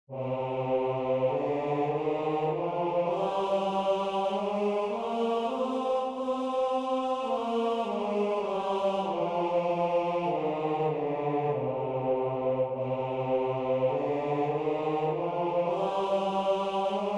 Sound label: follow bass